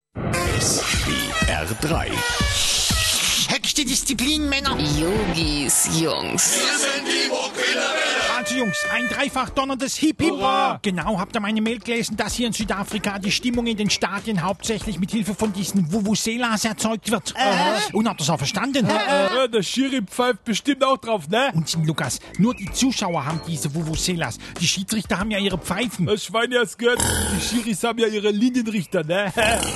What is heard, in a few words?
Vuvuzelas